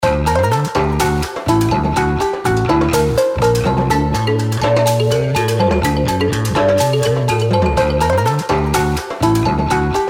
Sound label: folk; スパイス：民族04; maoudamashii